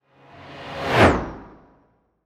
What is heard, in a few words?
Jetbike Flypast